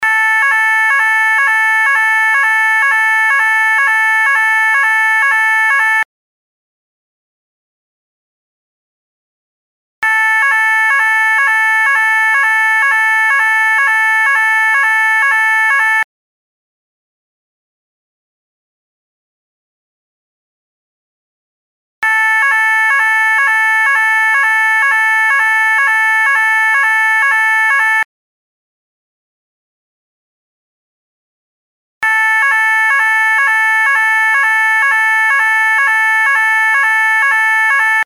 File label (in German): Sirene Wiederaufnahme des Spiels